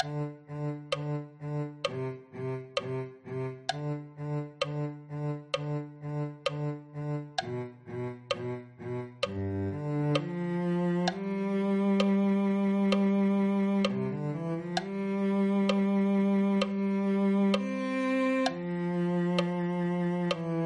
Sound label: cello